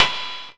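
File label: KINE, percussion metallic; Rarefaction; Poke in The Ear With A Sharp Stick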